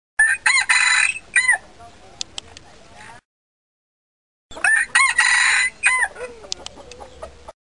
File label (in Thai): ไก่ป่าตัวเล็กขัน